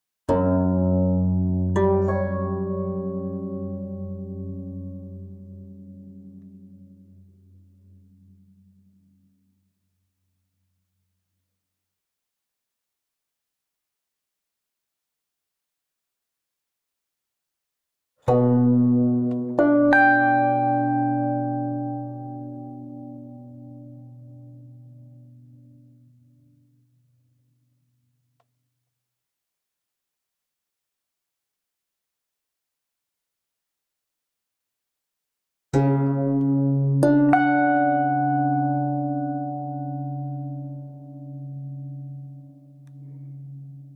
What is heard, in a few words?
GuZheng Playing Techniques Musical Interval Triple; 古筝; GuZheng II